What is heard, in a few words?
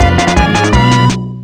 Level Fail Music; Spookware